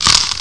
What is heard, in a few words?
Munching; Apeiron